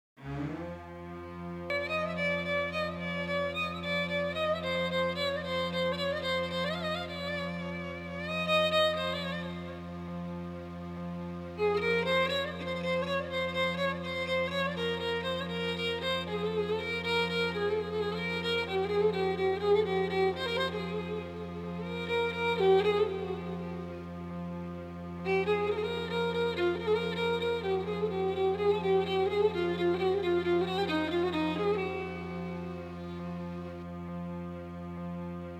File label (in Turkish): keman taksim; yamaha tyros2